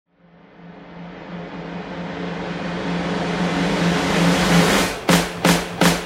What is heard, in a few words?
break, jingles radio fm